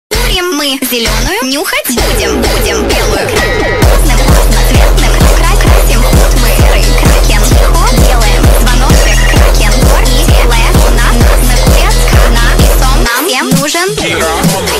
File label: remix